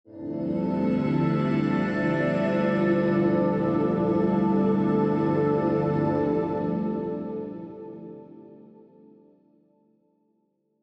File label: Synth Swell, Synth Swell Ethereal; Musical; Blastwave